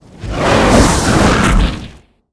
blaze hound attack

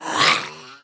snake damage

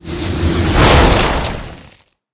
black ghost attack

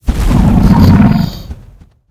lava demon, monster

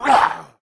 troll damage, monster

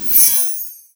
skill explo

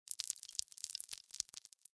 canyon, snow

